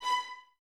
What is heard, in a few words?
Violins B 5, String Ensembles; Miroslav Vitous; LINKS ORCH